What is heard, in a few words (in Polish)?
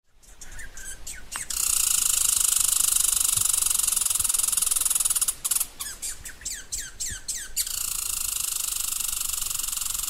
dziupla wiewiorki glos; multigrafia